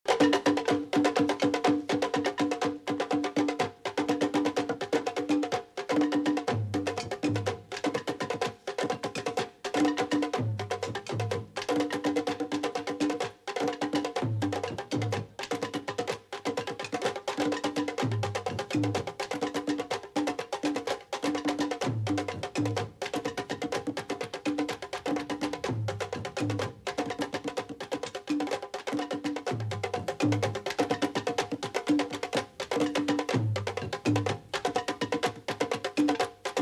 timbales de indio

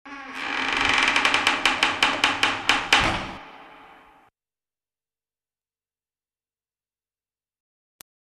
otra puerta

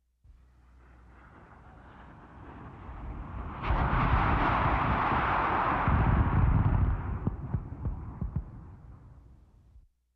misiles

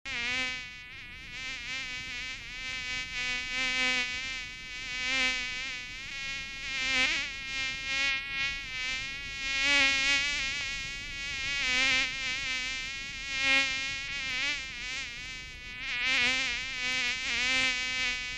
mosquito volando

